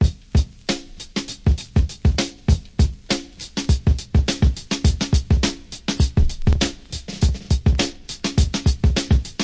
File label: new heavenly blue, drum loops and breaks, 100 bpm; 091 to 100 bpm